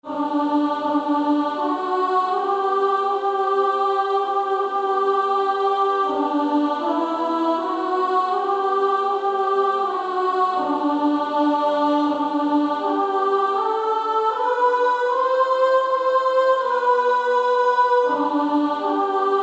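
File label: Female Choir, soprano 2; SSAA; Gracious Spirit Dwell With Me; S2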